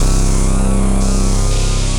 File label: Phasing Bass; Instruments; Brooding Bass; Ableton; Mood Reel